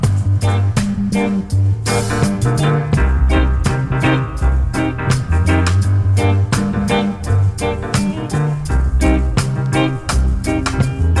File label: eastern organ